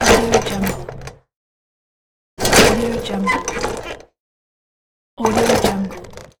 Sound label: old bicycle moving noises